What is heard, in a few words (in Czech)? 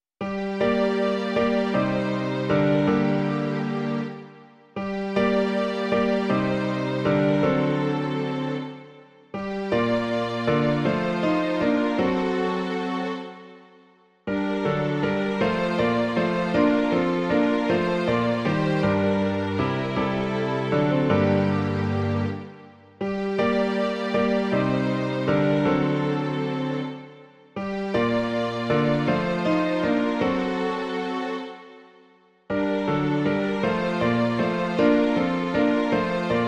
klavír a smyčcový orchestr; Pán Bůh má síla jest; Imaginativní verze